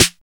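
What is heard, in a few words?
ANALOG MIX SNARE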